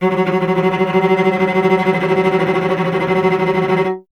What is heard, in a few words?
STR VC F#2, Cello; Miroslav Vitous; Solo Instruments VOL-1